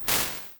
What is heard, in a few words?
Snare Hall, impulse responses, small spaces, halls; Apple